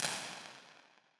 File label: Large Concert Hall - defined early reflections